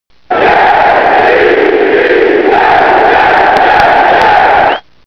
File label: j-e-t-s chant; jets radio